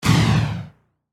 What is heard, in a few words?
Whoosh Phase Short, Accent, Transition; Production Element, Imaging Element